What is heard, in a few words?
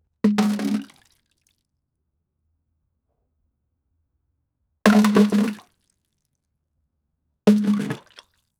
the sound of bucket throw; man made